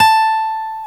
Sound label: GTR A5A, Dual Guitar, Ac 12+; Club-50 - Foundations